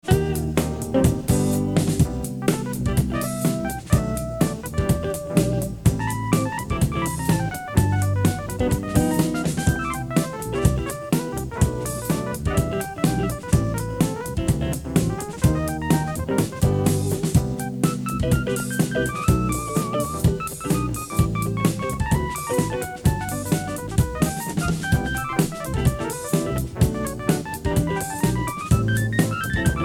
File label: Keyboard